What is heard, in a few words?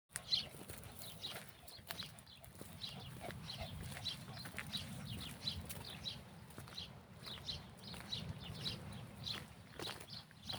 soundscape